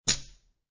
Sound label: Poker Chips Three Chips Dropping On Felt; Gambling